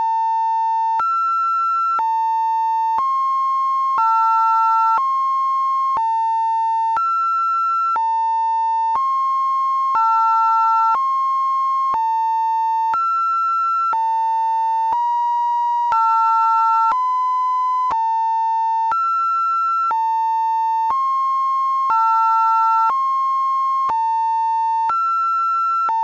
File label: sinewave